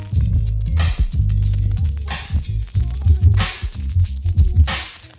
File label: party groove